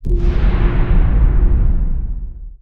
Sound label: elated impact; weapons; dota; dawnbreaker